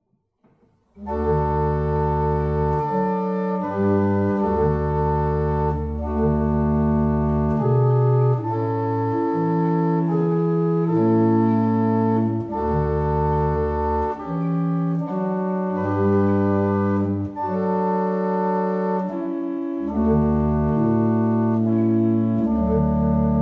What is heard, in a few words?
Double chant, barrel organ; Staunton